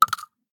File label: shell 12ga dirt; STALKER; IWP